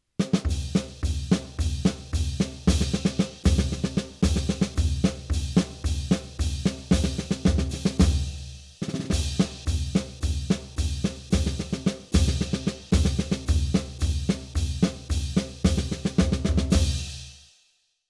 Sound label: Marching Theme; Bill Scott South